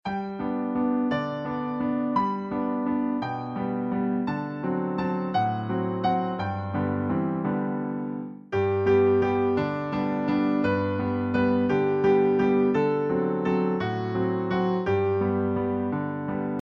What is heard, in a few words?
three little kittens instr; nursery rhymes